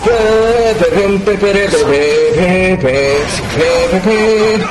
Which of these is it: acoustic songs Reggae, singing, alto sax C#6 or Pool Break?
singing